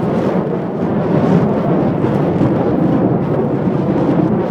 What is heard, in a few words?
Loop Fire